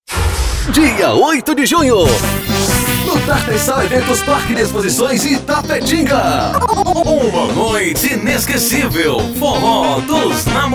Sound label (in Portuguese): forro dos namorados